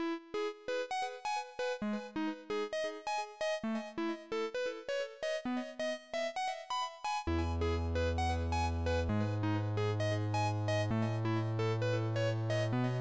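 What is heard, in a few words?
keygen song.xm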